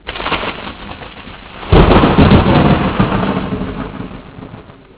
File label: lightning